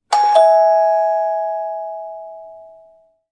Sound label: ding dong